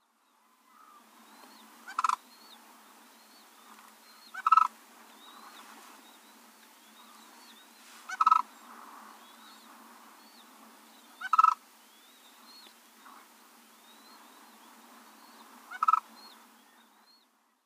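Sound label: sandhill crane, izumi; kanadaduru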